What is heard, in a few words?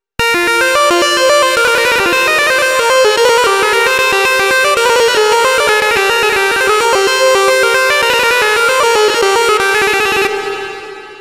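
flanger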